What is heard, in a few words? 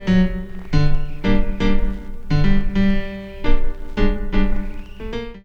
Piano; Sonic Foundry; Stylus Pressure Urban Grooves on Digital Wax; Back Forth